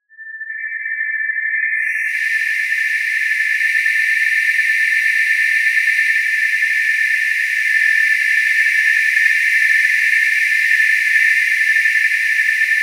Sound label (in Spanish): Loops mutantes